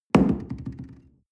coffee place tablecloth medium wobble, restaurant cafe